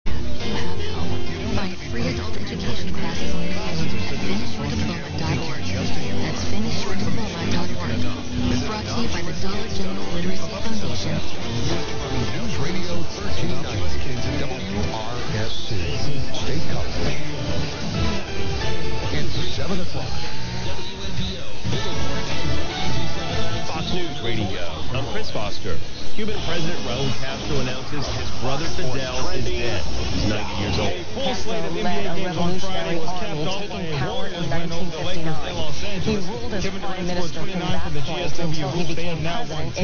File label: Middleport OH; 1390 WMPO